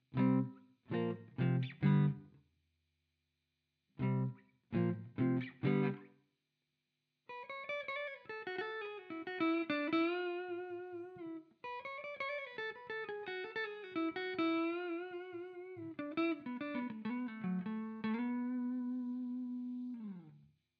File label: room small room